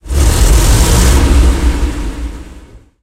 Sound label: stug40 engine load, tanks; engines; War Thunder